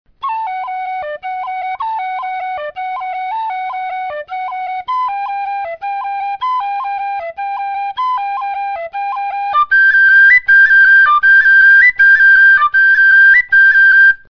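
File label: offbeat rolls, Tin-Whistle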